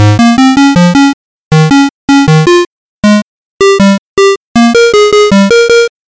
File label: melody; procjam